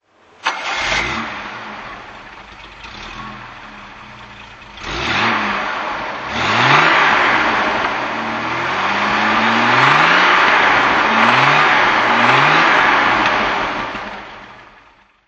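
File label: jaguar XJ6 42 coupe throttle, Engine sound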